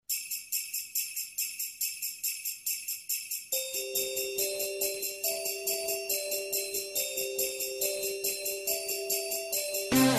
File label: Jingle Bells remix